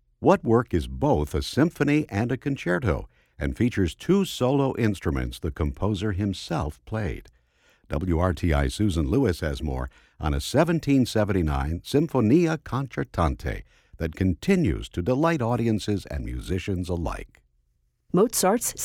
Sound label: Mozart Sinfonia Conc Violin Viola